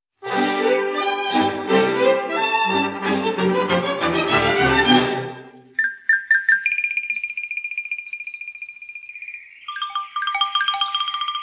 Orchestra

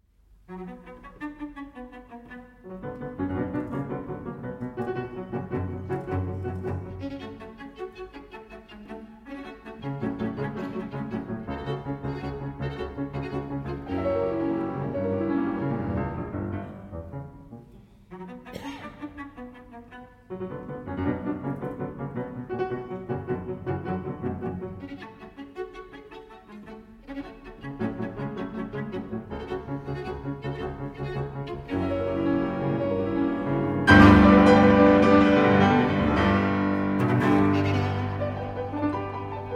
Piano Trio in B Major Scherzo (Live); No. 1; Op. 8; 1889 Version

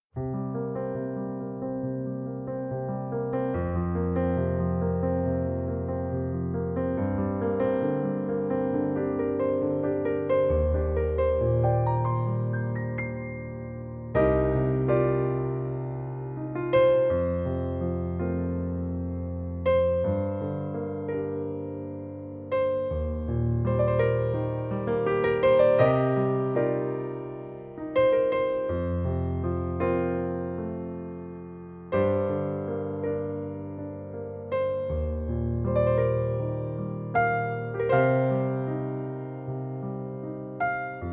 Piano Version

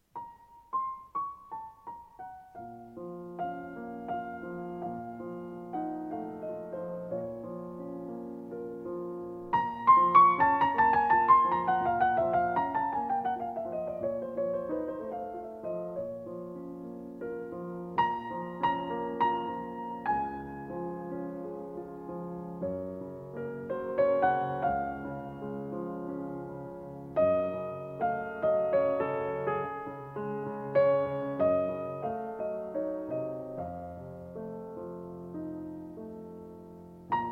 Nocturnes, Larghetto in B-Flat Minor

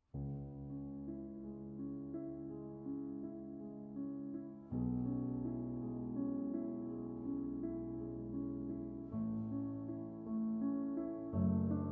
Piano Sonata in C-Sharp Minor, Adagio; Beethoven; Piano Sonata No. 14; Op. 27 No. 2 Moonlight; I. Adagio sostenuto

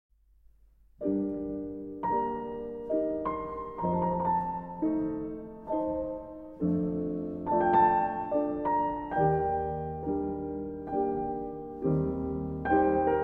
Keyboard Suite in G Minor, Minuet

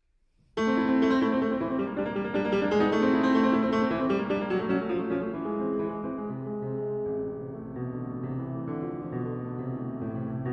Pour le Piano, Prélude; Debussy

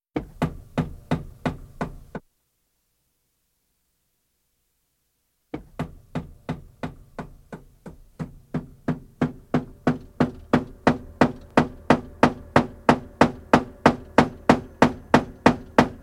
Piano Piece; Piano Piece 13; Carpenter's Piece; For Nam June Paik

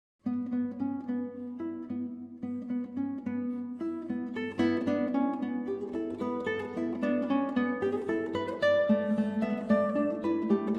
Sonatina For Guitar, Allegretto Leggiadro; Angelus

